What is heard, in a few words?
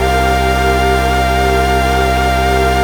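dance chord; E-MU Formula 4000 Series Vol. 2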